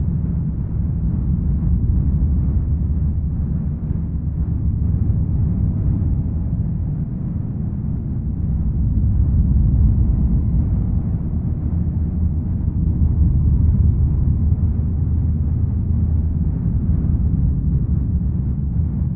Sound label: shroud creature idle; Stellaris; utopia